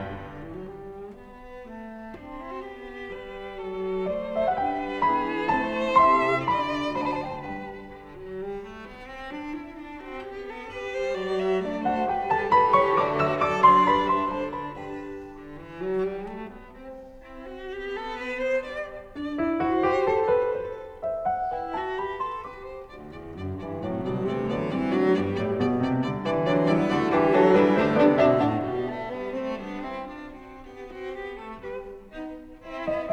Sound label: in G Major, Cello; 10 Variations on Ich bin der Schneider Kakadu; Op. 121a Variation V